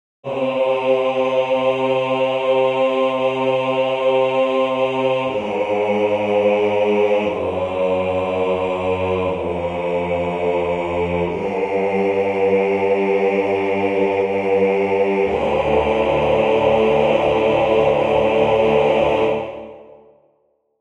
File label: Amen Bass